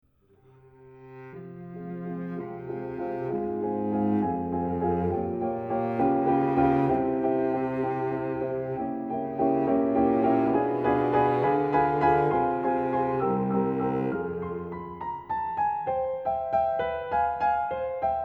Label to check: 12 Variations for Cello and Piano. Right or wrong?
Right